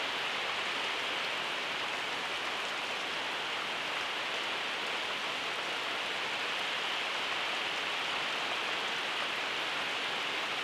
Rain Thunderstorm Ambience

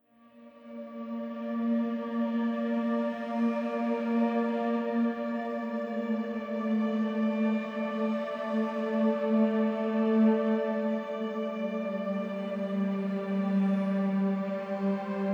Flamenco Music

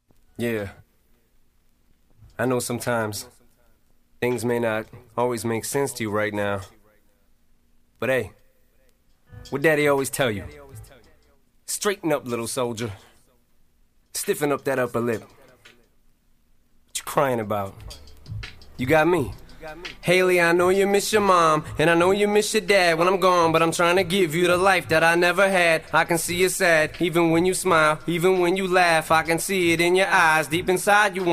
Acapella

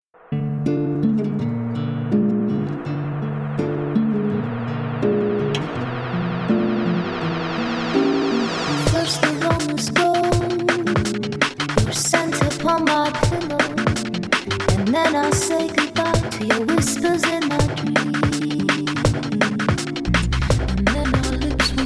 vocal

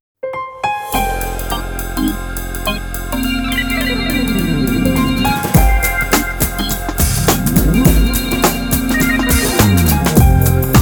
Lounge Groove

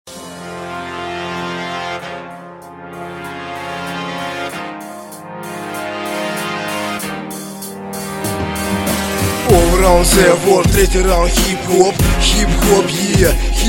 Promo

